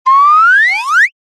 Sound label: cartoon slide whistle ascend climb very fast